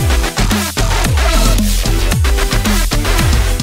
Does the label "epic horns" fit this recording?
Yes